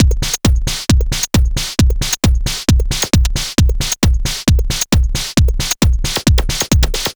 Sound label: Dance Drumloop; Drums; Dance Beats